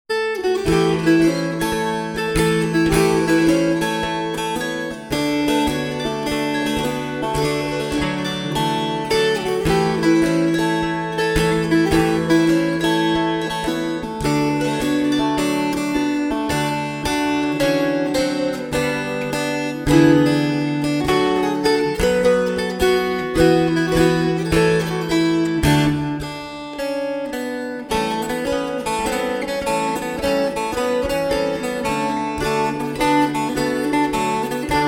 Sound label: slow; Pig Ankle Rag